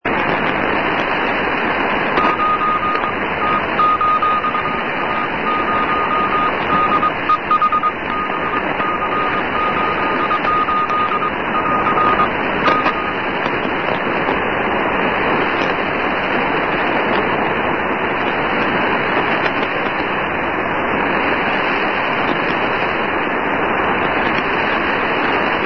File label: cw; icrc kinshasa '9to20'; rainer brannolte, d